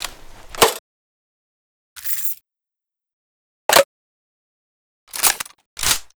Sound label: mg42 reload; STALKER